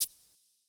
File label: Jazz hop shaker; Hiphop